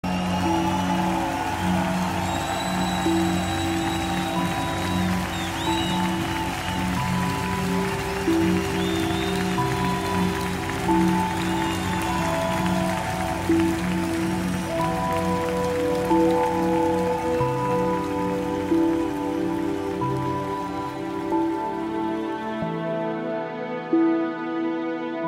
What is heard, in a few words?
Relaxing Music Epic Fantasy